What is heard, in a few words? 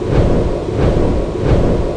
fan; CounterStrike; cstrike